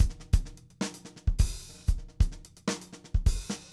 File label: GADD, drum